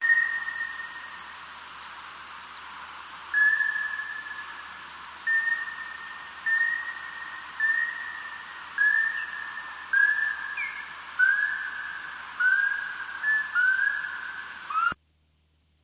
Social Hoary Bat; SwampCrk

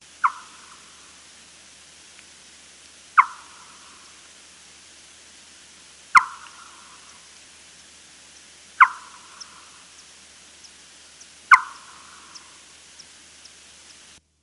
Spotted Bat; MosesCoulee

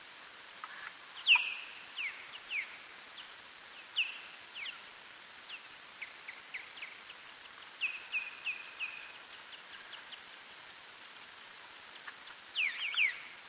Big Brown social, bats; GateSchoolhouse

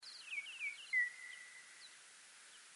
Social Hoary, bats; CapLk

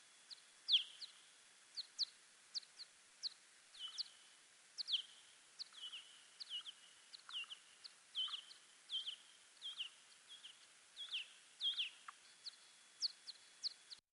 Social Myotis; VN-Marsh

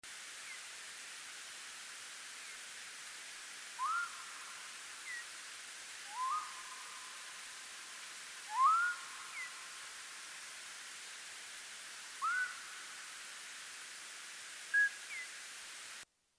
Social Hoary Bat; NoNameLk

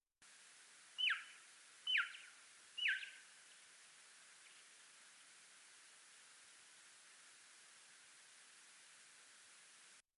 social Townsends, bats

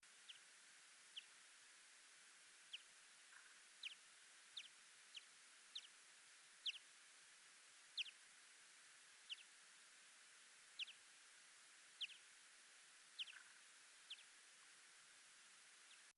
Townsends, bats; Capitol-Lake-InterprCntr

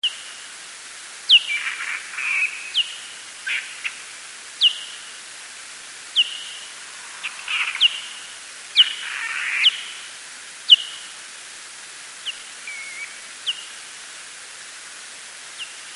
Social myotis, bats

